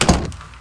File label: door stop; depths